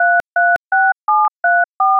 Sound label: tones 44100 stereo aac lc; nero